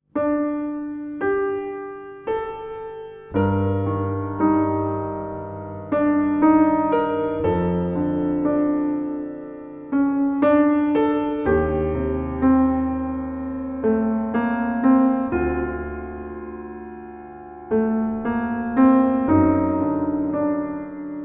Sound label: Slow Minor Piano Theme Solo Piano; Gratomic